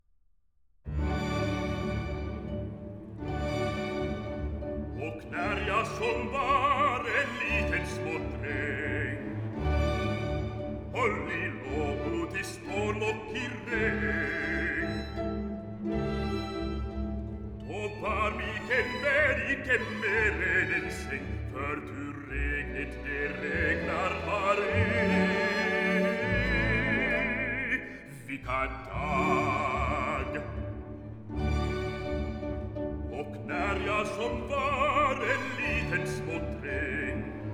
Version for Voice & Orchestra; Twelfth Night, Op. 60; No. 2, Hey, Ho, the Wind and the Rain; Turku Philharmonic Orchestra; Sibelius

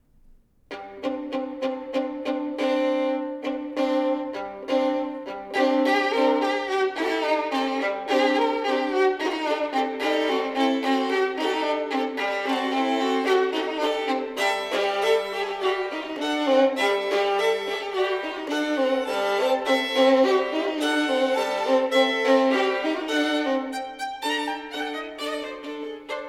Duos for Two Violins, Rumanian Dance

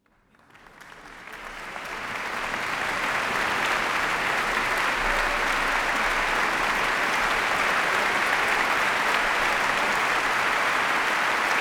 Applause; Sergiù Celibidache; Mozart Requiem in D Minor

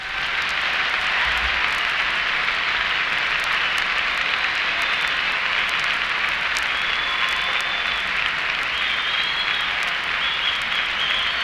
applause for second encore

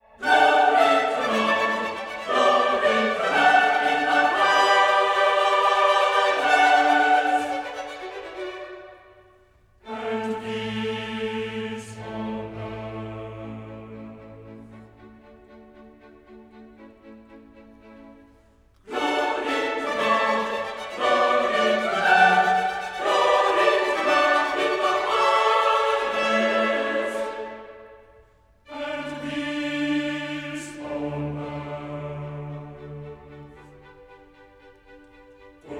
Chorus. Glory to God in the Highest; Sir Neville Marriner; Handel Messiah

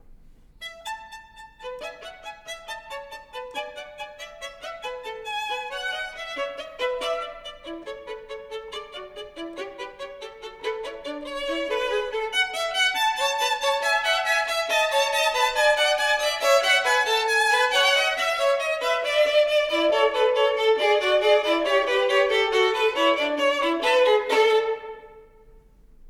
Duos for Two Violins, Teasing Song

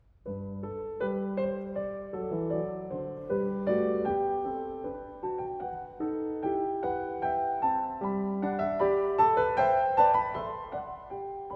Canone alla Terza a 1 Clav; Live at Carnegie Hall, New York, 2025; Goldberg Variations, BWV 988 Var. 9.

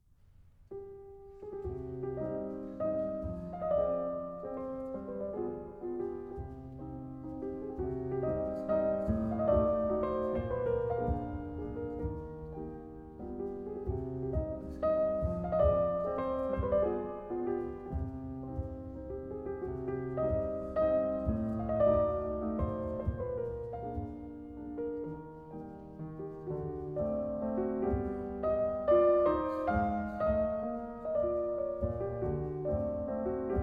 Mazurka in C Minor; Alexander Malofeev

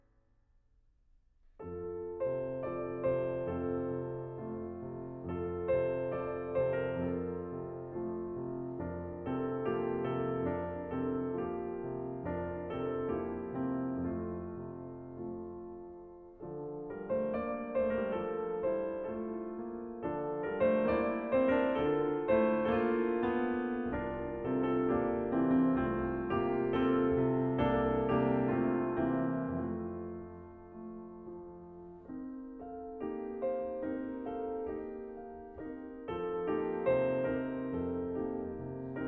Lullaby, Piano; 6 Norwegian Mountain Melodies, EG 108 II.; from Valdres; Grieg Complete Piano Works

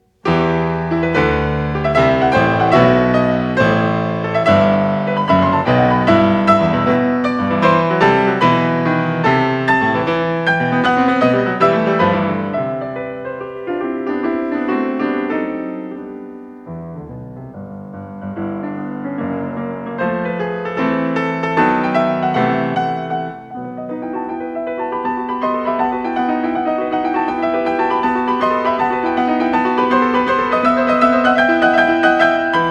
Piano Sonata No. 30 in E Major, Prestissimo; Claudio Arrau; Amsterdam 1962-1966

